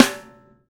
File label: LD Snare; Snares